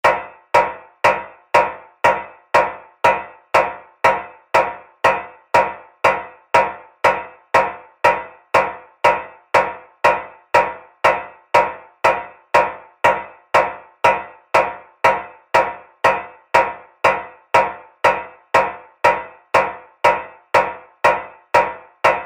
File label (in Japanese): 足音 金属 歩く; モノラル